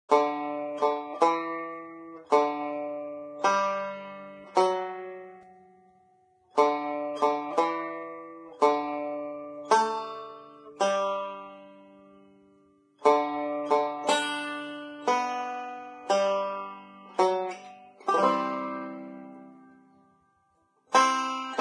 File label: Banjo; Zomboid; Lifestyle